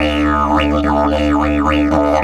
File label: 108, DIDGE 108BPM